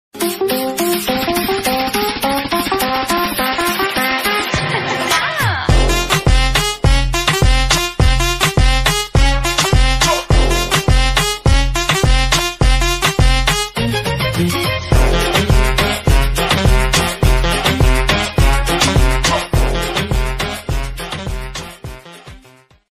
attitude ringtone, bad boy ringtone, English ringtone